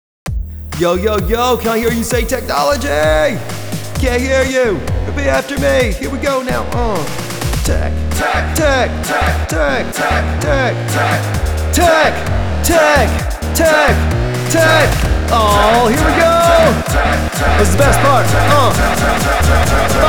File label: Vocal